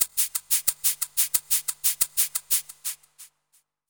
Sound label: Cooler Shaker, Percussion; Zero-G Smoke